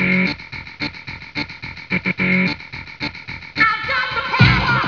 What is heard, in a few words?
snap